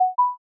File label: notification